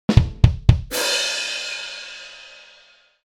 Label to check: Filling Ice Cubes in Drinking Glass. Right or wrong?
Wrong